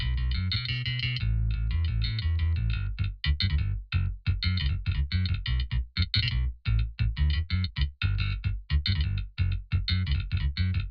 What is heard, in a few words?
Bass Amp 2264 After; neve